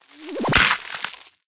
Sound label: big nut break; Candy Crush Friends